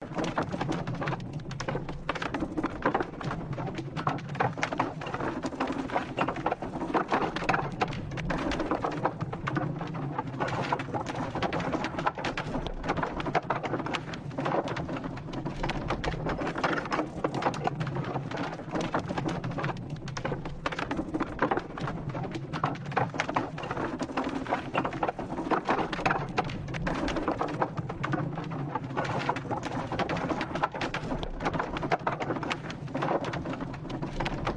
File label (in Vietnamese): tieng banh xe go lan loc coc